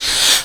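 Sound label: breath, female vocals, left; PlatinumPhattCD2; E-MU Producer Series Vol. 8 – Platinum Phatt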